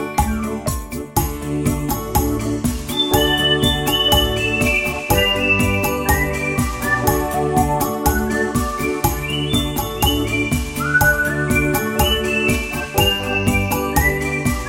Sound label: Latin Vocal